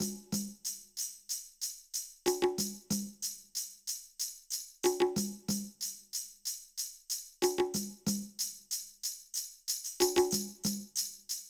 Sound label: perc loop, Drum Tracks, Smooth RnB